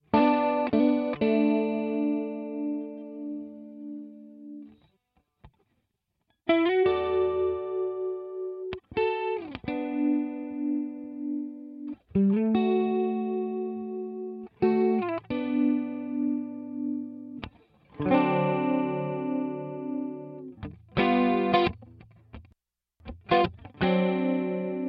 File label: Jazz Guitar Juno